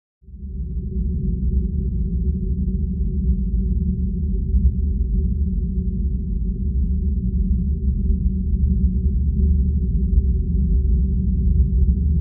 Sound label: Bed Impending Galact